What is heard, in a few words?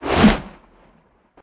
flame whoosh; Smite; Sol